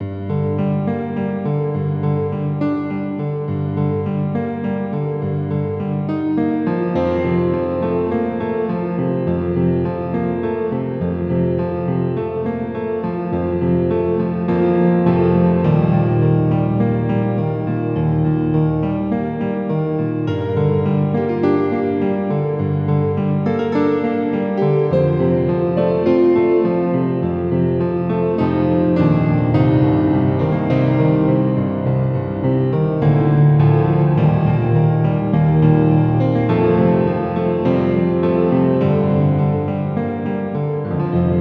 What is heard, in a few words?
Piano